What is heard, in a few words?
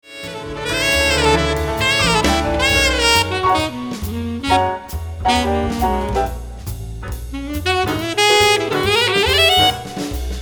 alto